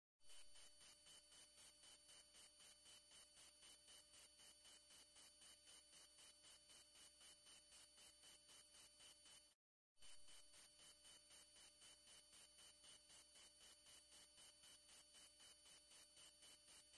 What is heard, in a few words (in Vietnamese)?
Am thanh duoi muoi